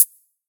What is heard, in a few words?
Terror Closed Hi Hat, Dubstep; Drums; One Shots; Cymbals